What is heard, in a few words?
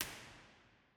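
impulse response, Lady Chapel St Albans cathedral; surround 5.1; williams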